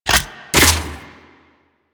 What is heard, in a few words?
Rifle Reload; Grn Kuva Lich Rifle